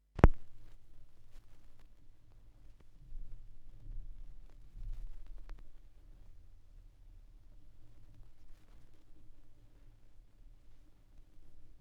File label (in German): schallplattengeraeusche, Vinyl